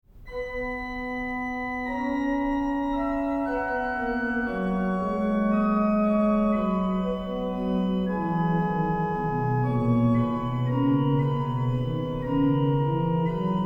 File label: canon; Canones retrogradi functionales (2016); 1) Canon primus: f(x)=x^2 (Non negativus discendens)